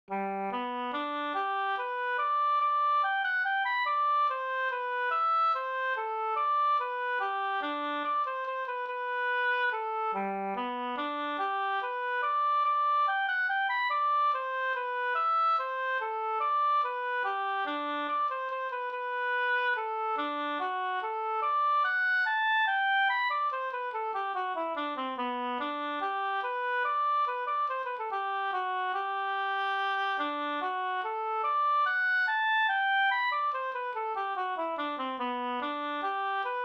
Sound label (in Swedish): menuett; e Petter Dufva nr78